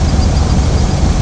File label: TANK; Shadow Master